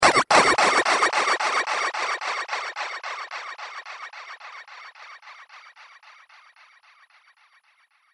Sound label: Laser Future Plastic Plasma Echo; Sci-Fi Laser Sound Effect; Laser Sound Sound Effects; Andrew Fleming